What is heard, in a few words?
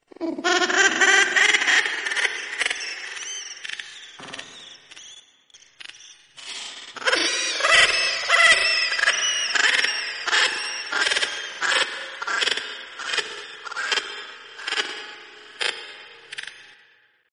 baby laughing slowed